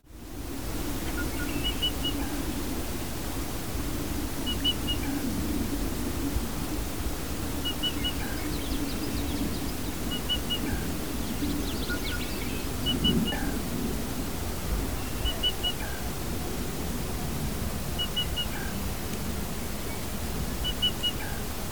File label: Black Rail